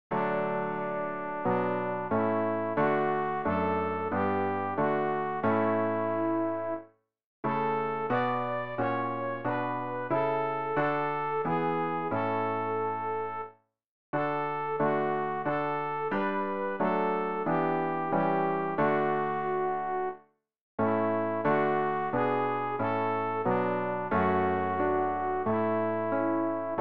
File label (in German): sopran; Ref. Gesangbuch; RG 620: Ich bitt dich, Herr